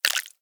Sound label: Specimen Liquid Drop; Medical; Blastwave